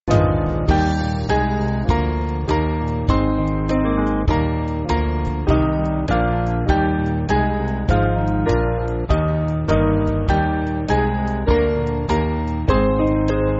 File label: Band, church music; O Lamb Of God; O Lamm Gottes